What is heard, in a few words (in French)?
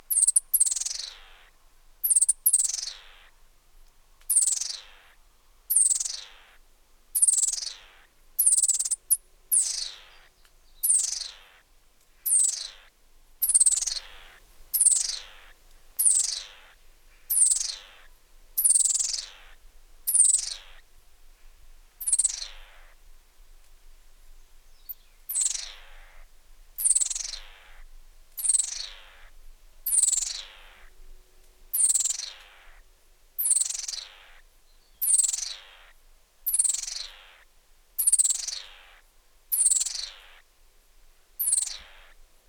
Lérot